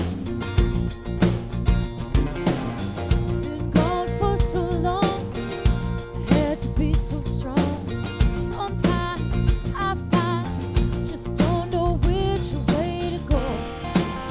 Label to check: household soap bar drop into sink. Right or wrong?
Wrong